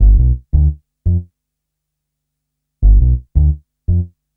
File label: Upright Bass, Drum & Bass; Fluid Dynamics